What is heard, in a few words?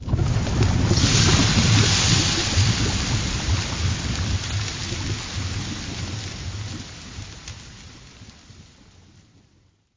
Burbling Lava to Steamy Fizzle